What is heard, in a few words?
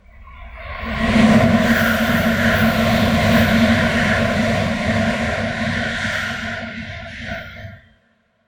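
shinkansen run; sinkansen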